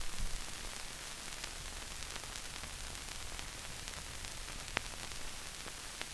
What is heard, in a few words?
Crackle, Vinyl Noise